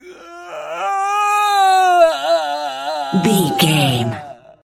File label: voice male death torture